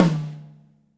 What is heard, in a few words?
tom, Drums Acoustic; USB Soundscan vol.10; AKAI; 90s sample CD; DYNAKIT 2